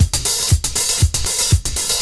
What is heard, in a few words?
CYMB, Breakbeats; USB Soundscan vol.46; AKAI